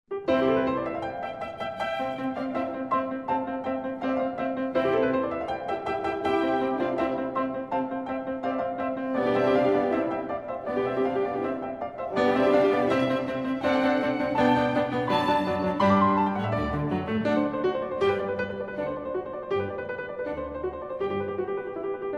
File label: piano; Haydn